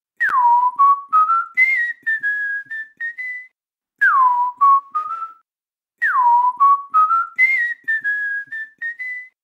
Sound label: guy whistle